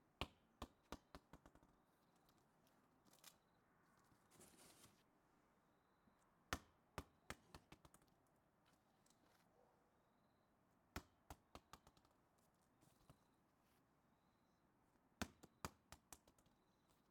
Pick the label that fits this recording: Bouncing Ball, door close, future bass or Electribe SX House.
Bouncing Ball